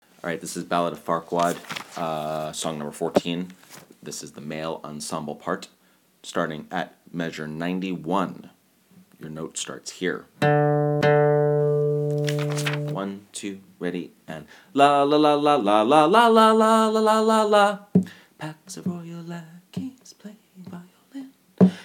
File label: male ensemble